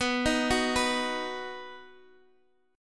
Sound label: B Major, Guitar, Open D